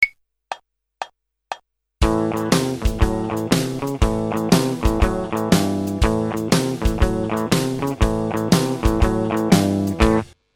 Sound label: spread riff; blues rhythm